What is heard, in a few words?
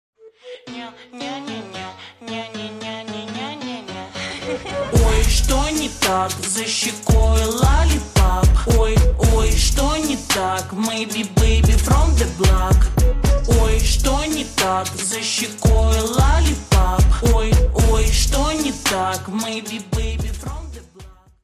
slowed reverb